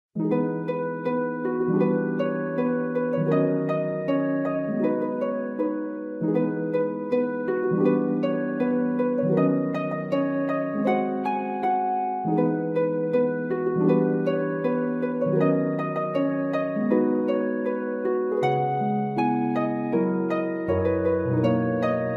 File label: harp ver.